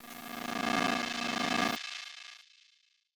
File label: Radio Feed